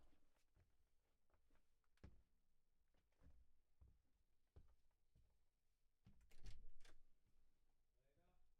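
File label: Kit, drums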